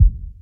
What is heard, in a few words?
Menu Horror